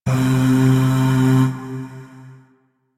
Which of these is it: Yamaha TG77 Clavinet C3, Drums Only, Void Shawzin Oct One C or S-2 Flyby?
Void Shawzin Oct One C